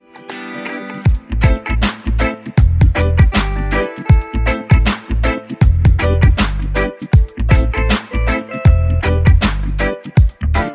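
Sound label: caribbean ringtone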